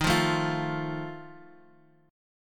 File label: Eb Suspended 2nd Flat 5th Eb 4G 5C 5E 5A